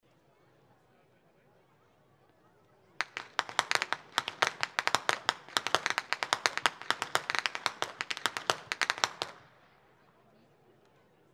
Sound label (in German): schnalzen, jugend